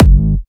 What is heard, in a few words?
Jump Kicks, Bassdrums